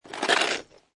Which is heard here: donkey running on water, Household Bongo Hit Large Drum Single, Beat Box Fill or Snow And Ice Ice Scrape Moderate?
Snow And Ice Ice Scrape Moderate